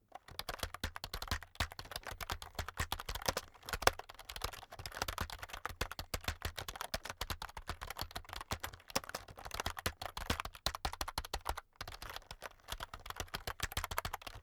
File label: keyboard external type sequence fast heavy, computer; kommunikation